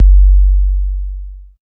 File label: KICK 2; Phat & Phunky; East West